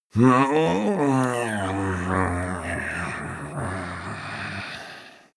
male growl tired; menschen; koerpergeraeusche; soundpool

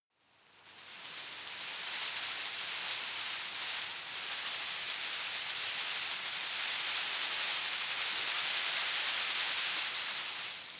shrub tiny leaves rustle medium; haus-garten

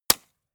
gun shot silencer close

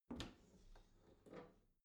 bathroom cabinet open soft squeak; objekte; tueren

